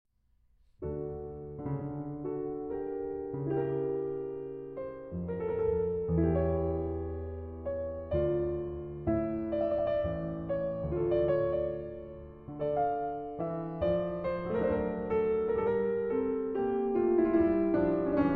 Pièces de Clavecin, Suite in D Minor, Sarabande; Book 1, Suite No. 1; No. 5

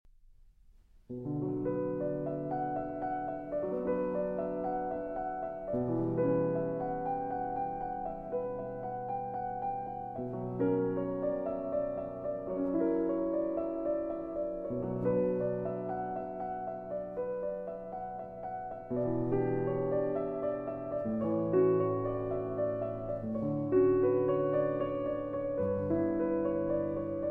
Prelude in B Minor

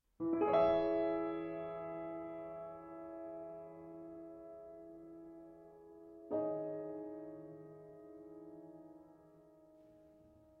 Solo Piano

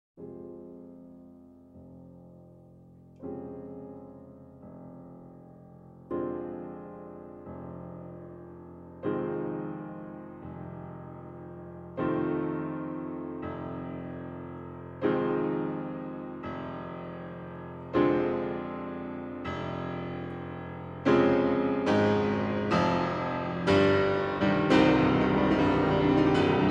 Piano Concerto No. 2 in C minor - First movement; Brief Encounter, 1974

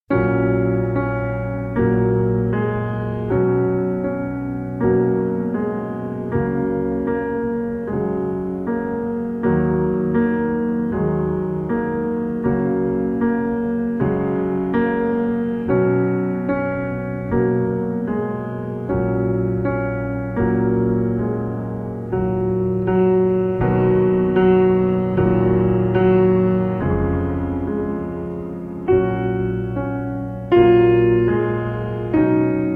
Solo Piano

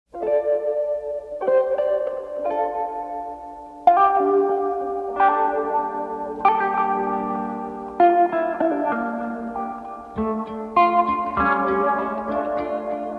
Cumbia

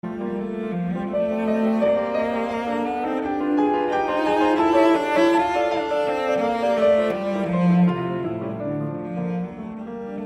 Cello Sonata No. 2 in G Minor I. Allegro

